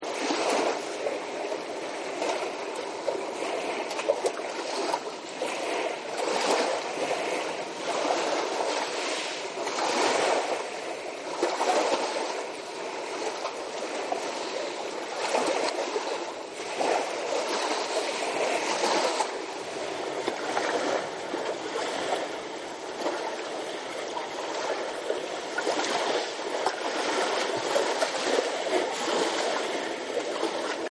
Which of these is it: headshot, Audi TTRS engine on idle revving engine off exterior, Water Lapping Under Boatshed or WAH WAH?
Water Lapping Under Boatshed